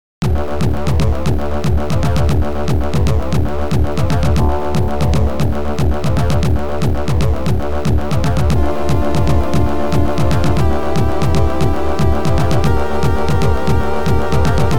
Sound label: OPL2; Tyrian